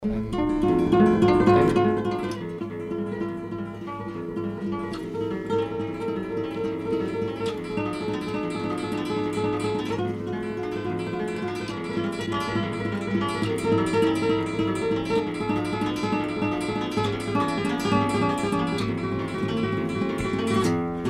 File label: Classical